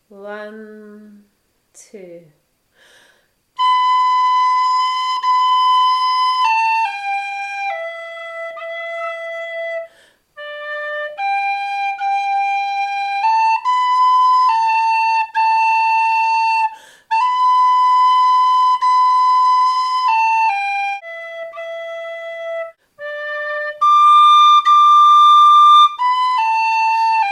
tin whistle; The Rattlin Bog

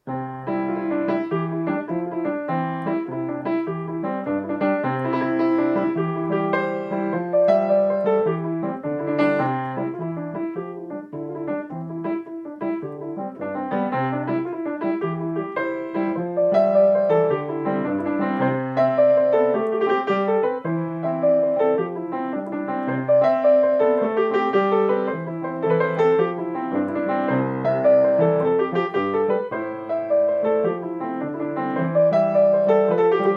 Piano; The Hole in the Hedge

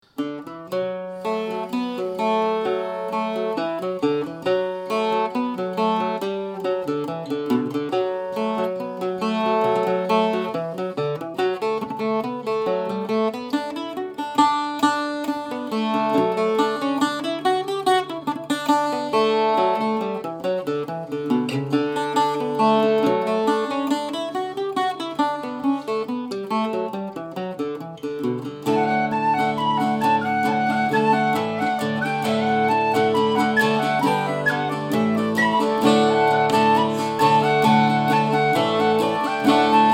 Bouzouki; The Mountain Road

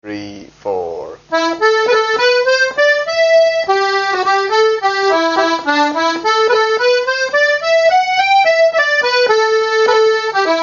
Polka Accordion; The Ballydesmond Polka

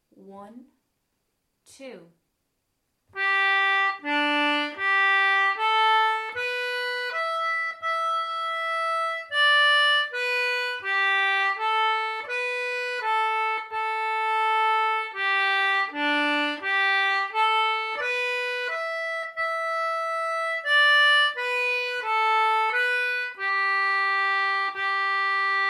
Concertina; Maggie in the Woods

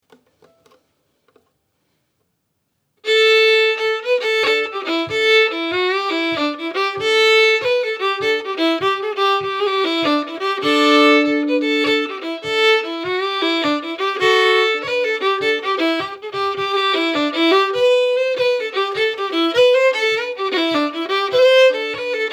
Slip Jig; Elizabeth Kellys Slip Jig

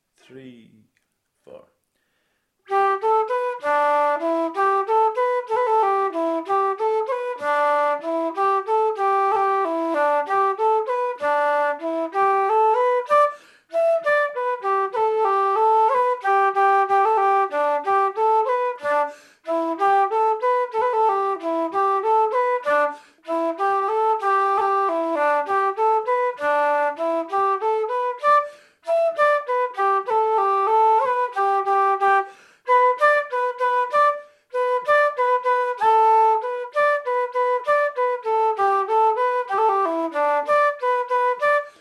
Flute; The Quakers Wife

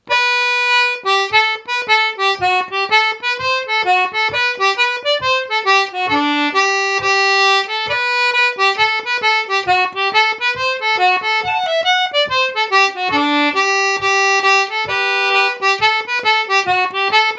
Hornpipe; Kit O Mahonys Hornpipe

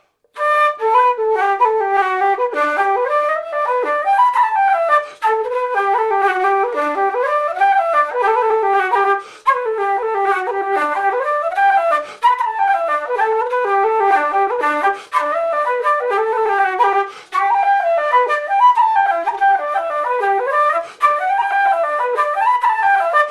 Flute; Willie Colemans Jig

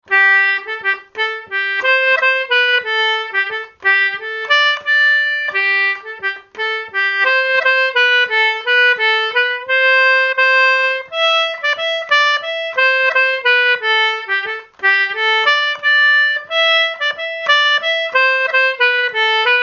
Concertina; Road to Prizon

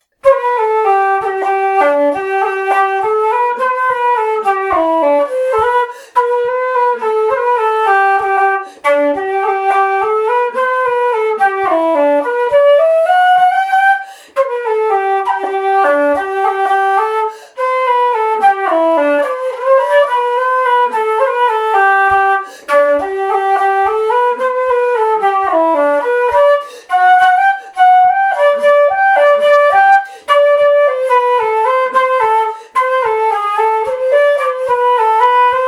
Flute; The Holly Bush

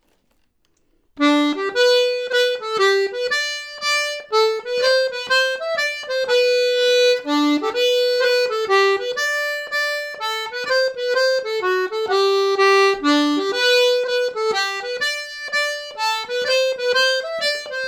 Donegal Mazurka

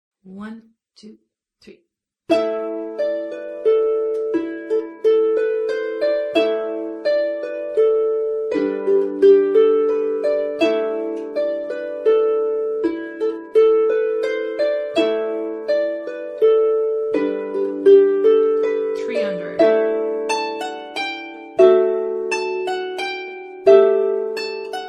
Harp whole tune practice; The Rocky Road to Dublin

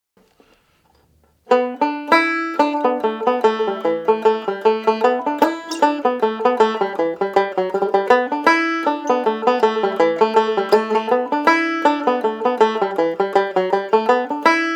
Banjo; Dans Breens Reel

